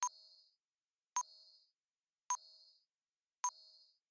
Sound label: bomb detonatable ticking